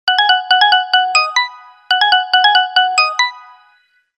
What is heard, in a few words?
Short tone; Samsung GT-S7070C-Diva-China